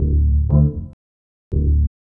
Bass pump; Sonic Foundry (Sony Creative Software); Synchro Funk

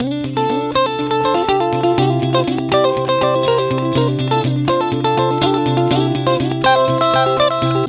guitar, stringed instruments

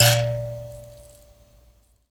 PLK Grand Mbira

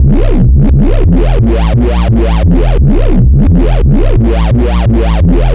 Convoy Bass, Drum n Bass; KJ Sawka; Drum n Bass & Beyond

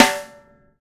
LD Rim, snares

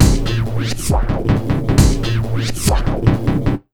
Big Beat Drumloops, 135

